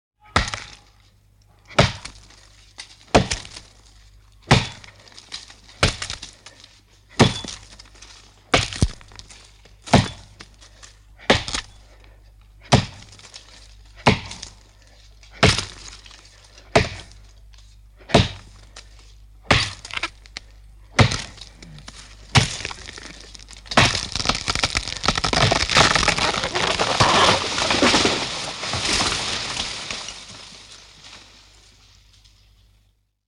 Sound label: industrial tools axe chop tree and fall old recording; sound effects one